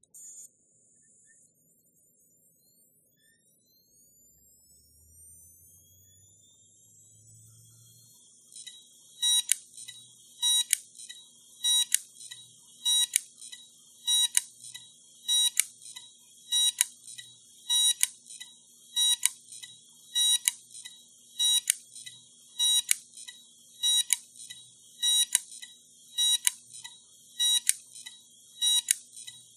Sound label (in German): Toshiba Festplatte klickendes Geräusch